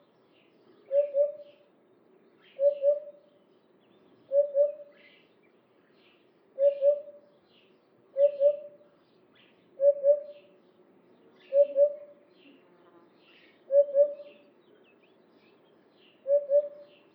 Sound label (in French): Huppe fasciée Upupa epops